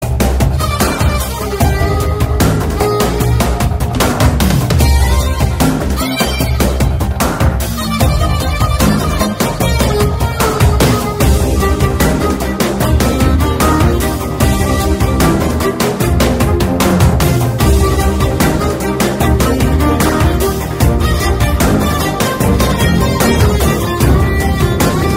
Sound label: malayalam music bgm instrumental background music ringtone; RRR